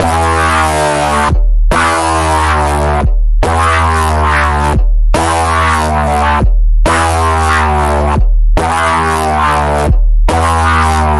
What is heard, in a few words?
Pulse, E